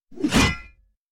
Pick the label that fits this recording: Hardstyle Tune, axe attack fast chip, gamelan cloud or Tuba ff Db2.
axe attack fast chip